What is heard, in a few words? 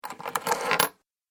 Fumbling With Coins; Money Sound Effects